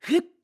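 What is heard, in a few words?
Attack Two Handed, voice; Link; BOTW; Breath of the Wild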